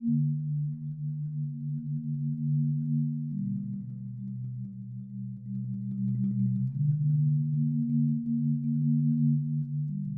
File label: Marimba